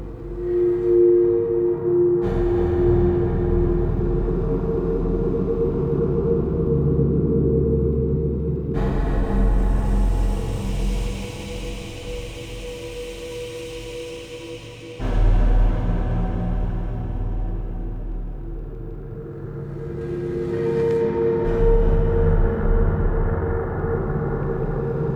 Interior Large Lab Bed Loop, Ambience; Warframe; Entrati

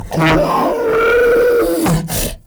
Ancient Tank Pain, Enemies, Infested, Barks; Warframe

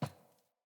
Boot Walk Metal, Footsteps; Characters; Enemy; Boots; Sinecure; Melee Grunt

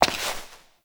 Step Rock B; Warframe; Koumei

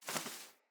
Wing Flap One, Animals; Floppet

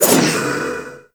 Ball Throw Foley; Warframe; Stalker

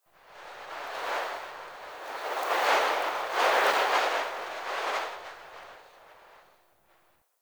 Wind Gust; Warframe; Eidolon Plains; Ambience

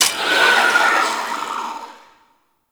Grimoire Vulnerable Impact; Enemies

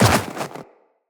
Run Snow, Footsteps; Tenno

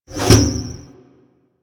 Attack Osprey Flaps Close; Warframe; Corpus

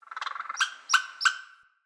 Squirrel Trapped Call; Encounters; Animal Rescue

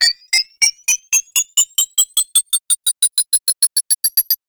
Grenade Countdown; Orokin; Grineer; Weapons

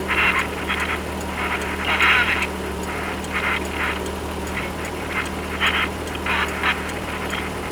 Parked Car Idle Loop; Ambience; Props; Warframe; Nineteen Ninety Nine

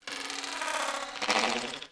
Beep Stutter; Warframe; Corpus

